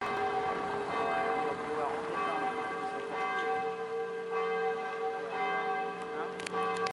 CATHEDRALE SONNERIE DE CLOCHE; ANGOULEME 16

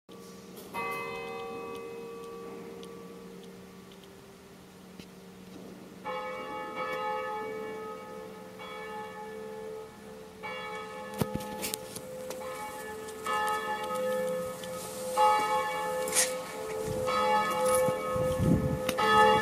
ANGELUS MIDI; ALLAMPS 54

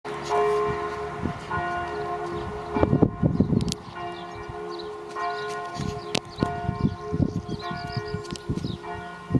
Cloche; SAINT POMPAI 79

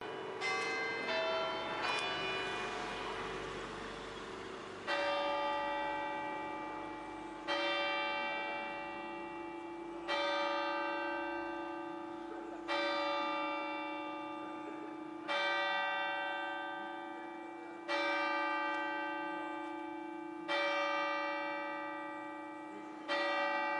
CATHEDRALE CLOCHE HORAIRE; ANGOULEME 16

